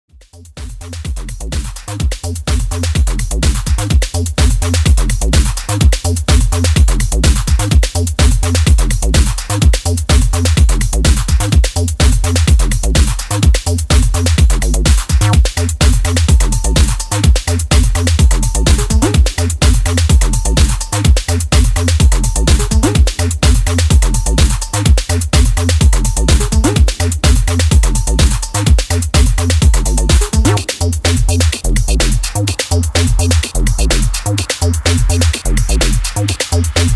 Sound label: Beat